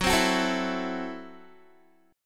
Gb Minor 7th Flat 5th, Guitar; Gbm7b5; strummed

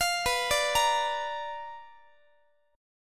B Minor Major 7th Flat 5th Guitar; BmM7b5 arpeggiated

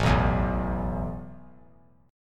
A Suspended 2nd A; Asus2 strummed

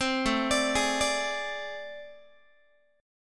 A Minor Major 7th Double Flat 5th A, Guitar; AmM7bb5; arpeggiated; 15,17,17,18,18,x

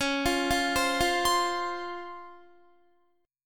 C sharp Major 7th, Guitar; arpeggiated; 20,20,17,17,17,20

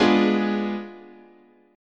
Ab Diminished 7th, guitar chord

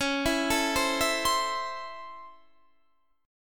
Db Minor Major 7th Sharp 5th Db, Guitar Standard; DbmM7#5 arpeggiated; JGuitar

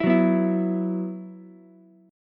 F sharp 7th Suspended 4th, Ukulele; F#7sus4 strummed

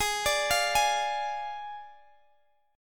F Minor 6th Ab

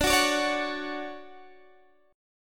D Major 7th, D, down, Guitar DADGAD

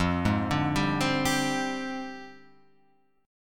F Minor 6th Add 9th, Guitar Open F chord; JGuitar

